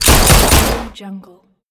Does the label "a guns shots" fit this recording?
Yes